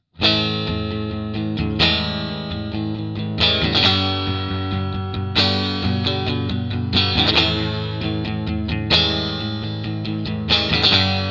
bassman; ik multimedia amplitube hendrix

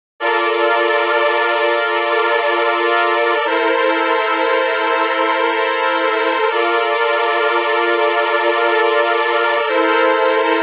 arp quartet violin ens fx; gforce vsm

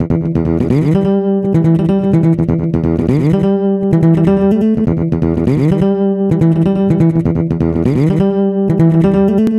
bass; i3dsp quattro x

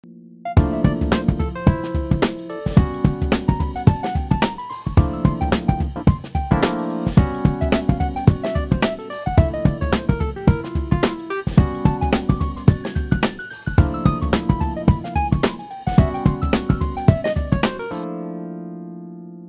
a200, electrik piano; native instruments

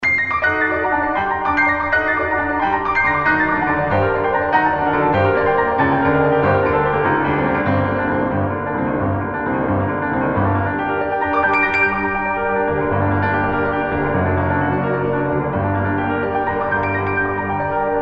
massive upright; propellerheads; reason pianos

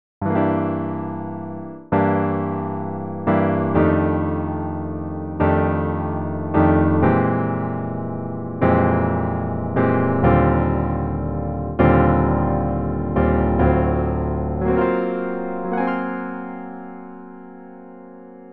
acoustic

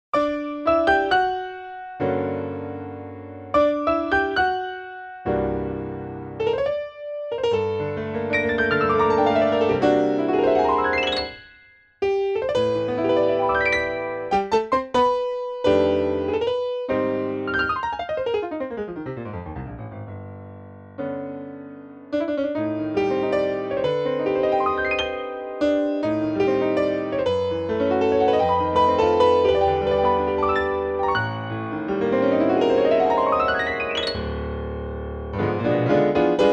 piano; ik multimedia

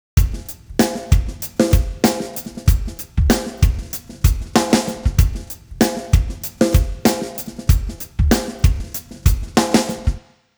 drums, timestretch 110; digidesign pro tools ts

